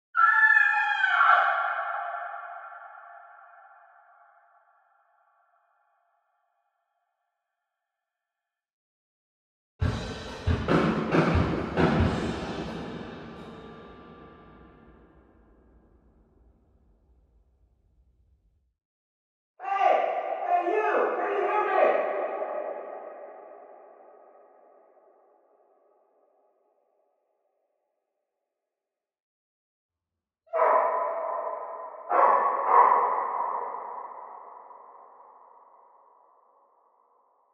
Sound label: 50m wide valley; boom